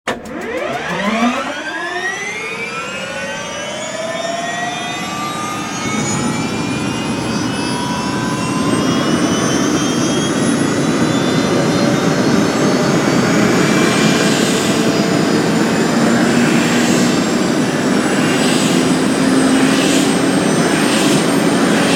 helicopter startup ringtone

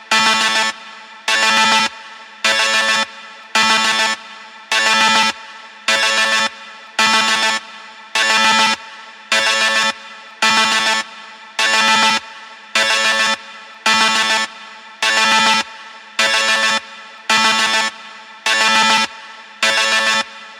rebound alarm ringtone

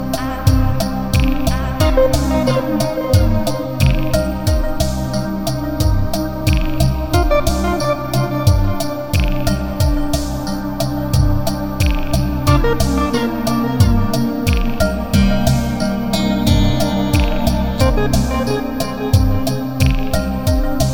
ambient electronic music

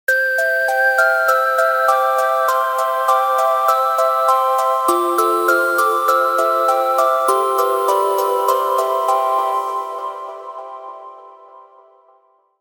deck the halls synth melody

